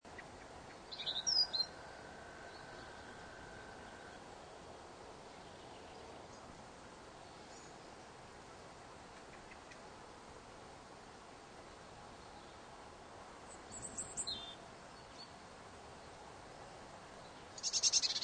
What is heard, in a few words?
Light Wind And Birds Loop Long, Outdoor ambience, light wind and few birds; Outdoor ambience, light wind and few birds. Long version.; Wind (Sound Effects:Weather)